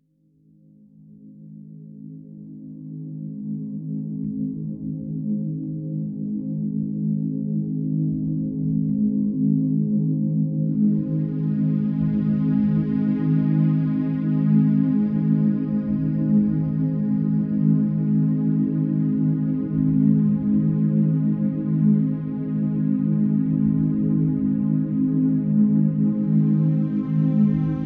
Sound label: binaural alpha waves